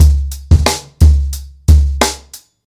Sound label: 90 bpm breakbeat c sharp key, kick tuned to the C# note